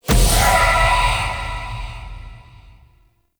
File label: Evolving Weapons Upgrade Success; Warframe; Zariman